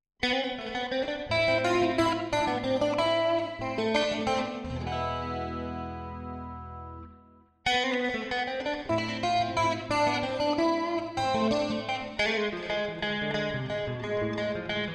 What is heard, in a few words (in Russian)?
TapeDeck, Играем на гитаре